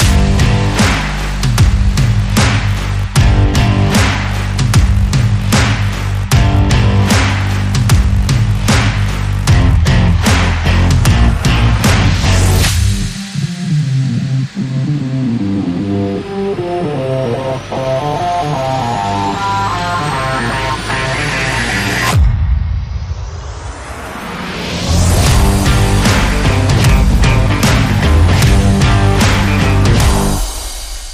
No Lead No Vocal

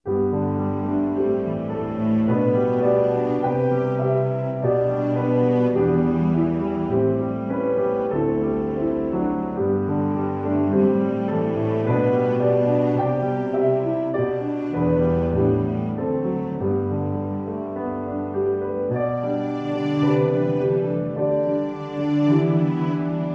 With Strings

